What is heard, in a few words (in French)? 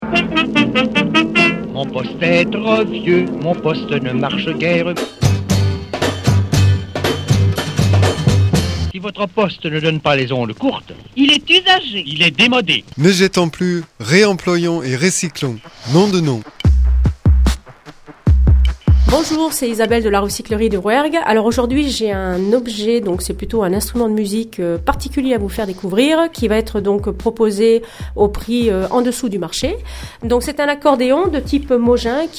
Accordeon; RECYCLO